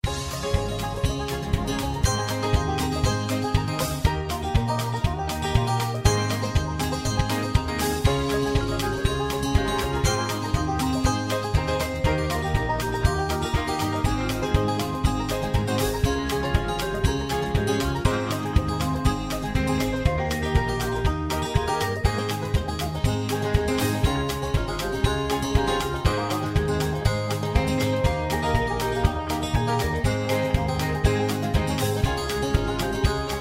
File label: High Key